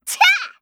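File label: attack, Voice Line, Korean; Eternal Return; Garnet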